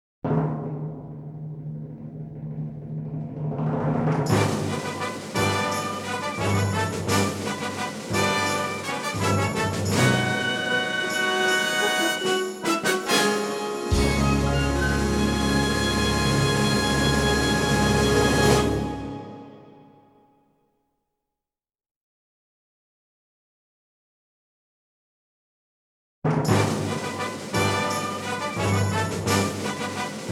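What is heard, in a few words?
Main Fanfare - Instrumental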